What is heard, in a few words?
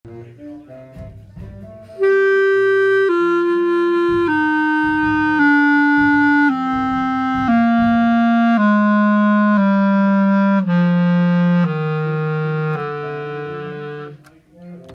Clarinet lower register